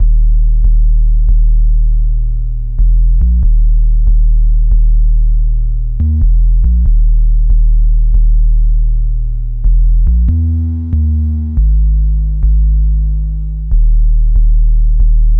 140 drum loop 808 E

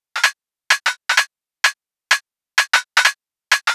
128 drum top loop indoors

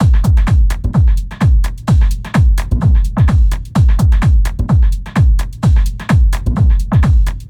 Beat Loop 128bpm

